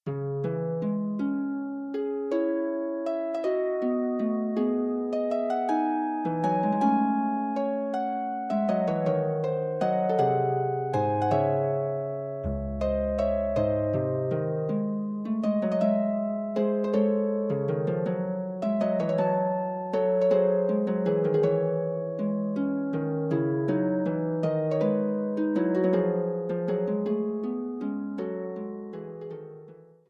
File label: Prelude, harp; Forty Eight Preludes and Fugues Book 2